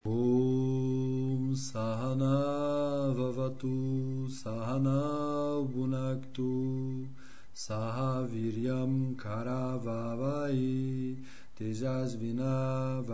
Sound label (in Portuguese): Mantra da paz